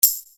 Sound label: Hat, EuroDance